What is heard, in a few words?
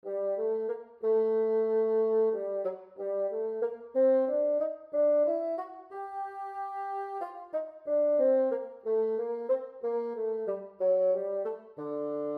Bass Clef Example